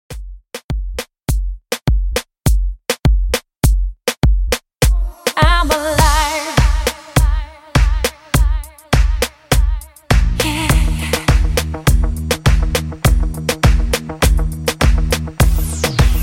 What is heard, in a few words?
Dance Club 102Bpm